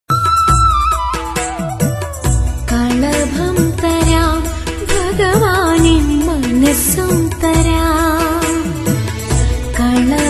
malayalam ringtone

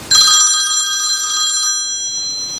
old phone ringtone; iphone 3g; iphone 3g ringtones

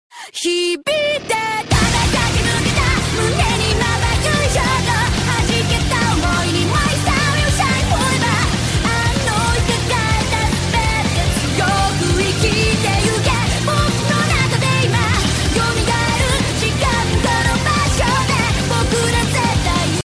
huawei p8 official ringtone

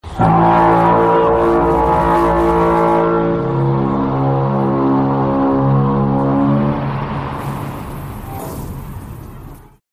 i phone 5c super ringtone

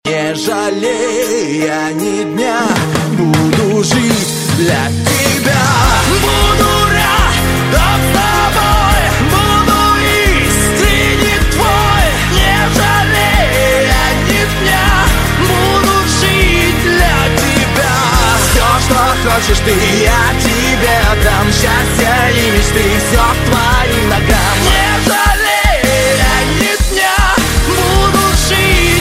iphone 6 remix ringtone

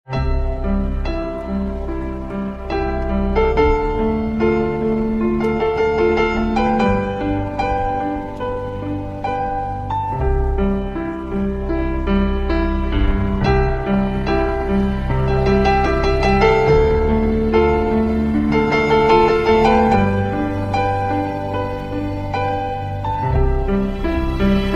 ballade i phone 3g s ringtone

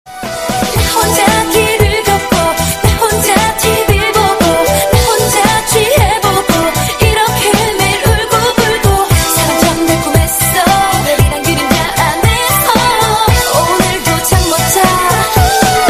new korean ringtone

